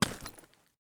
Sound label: concrete footstep